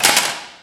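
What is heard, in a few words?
physics clipboard impact medium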